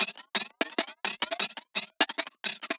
Flick Me FX; breaks; m8 breaks

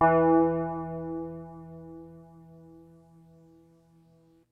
WURLI E-PIANO; Kid Nepro - Elite

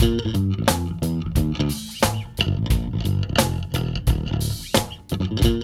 LOOP, Funky Ass Loops; East West

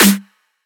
Snare; M8; Electronisounds

